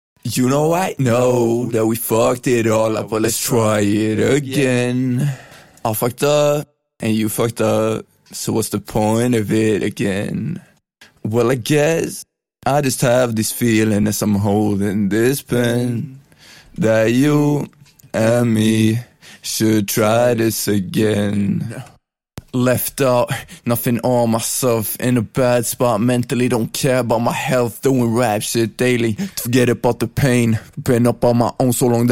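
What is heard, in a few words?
hip hop 89 Am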